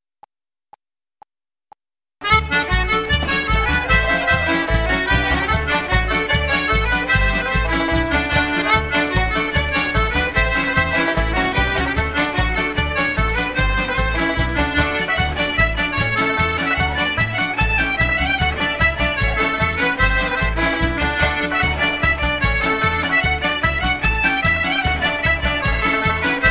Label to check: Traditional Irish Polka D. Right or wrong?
Right